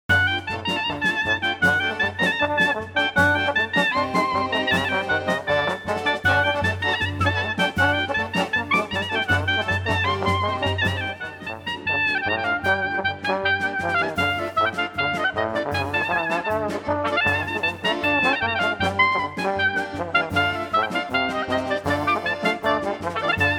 snippet of dance medley at wedding with drums of course, klezmer band